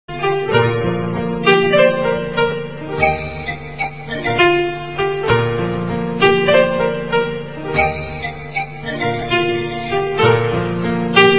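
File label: instrumental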